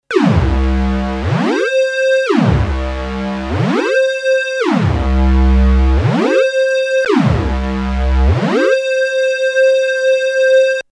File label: detuned portamento; obx; vst audio